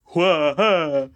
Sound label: Cheer